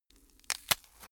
bone fracture

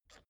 suitcase pull down latch lock soft

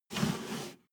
step barefoot metal slide medium

